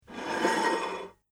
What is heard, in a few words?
Medium Sized Dinner Plate Slides; Dishware Sound Effects; Household